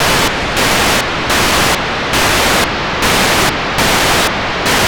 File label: GRBAlpha 437MHz025 9k6 GFSK, satellite; On October 8th 2023 around 09:15 UTC Daniel DL7NDR received on 437.025 MHz the 9600bd GFSK